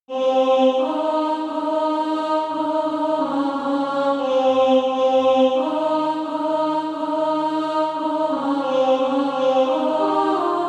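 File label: alto, SATB Practise Music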